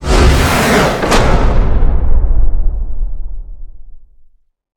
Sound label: applause; osu!